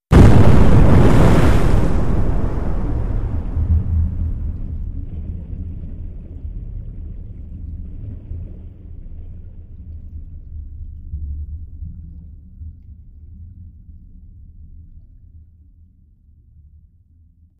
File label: Underwater Explo, explosions